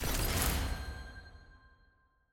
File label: research system